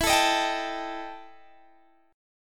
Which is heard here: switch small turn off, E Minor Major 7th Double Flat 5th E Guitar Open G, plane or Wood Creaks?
E Minor Major 7th Double Flat 5th E Guitar Open G